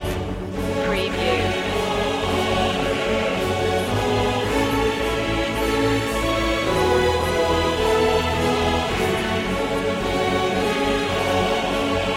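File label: no percussion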